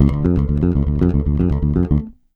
RUMBLE D, Bass, 112 BPM; Sampleheads

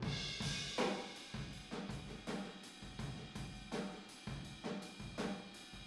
Boogaloo Chorus Room ST, 82 BPM

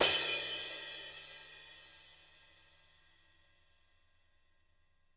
CRASH; Spectrasonics; Backbeat; CRASHES

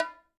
HI BONGO OPEN P; Sonic Reality; Interactive Drum Kits

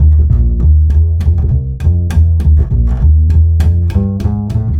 Acoustic Bass

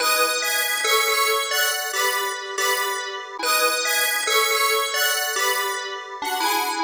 Lead, Drum N Bass, Keys Pads; Sonic Foundry (Sony Creative Software); Knutrix Drum & Bass

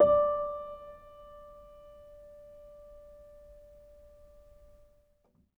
L, Acoustic Piano, PIANO STEREO; InVision Interactive; Miroslav Vitous

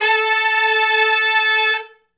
Hi Medium Long Note (A) uni, Horncraft; Sections; R&B; Crimson Blue and Fabulous Horncraft 4 RnB

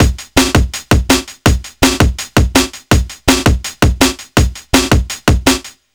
165 break, Explosive Jungle; USB Soundscan vol.05; AKAI; 90s sample CD

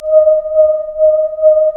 D#1, Bloogles & Buz

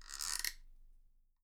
GU; Miroslav Vitous - Percussion & Harp

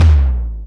Simmons; Proteus 2000; Multisamples; Digital Sound Factory Proteus 2000

